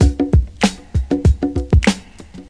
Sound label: 97 bpm fresh hip hop drum groove c sharp key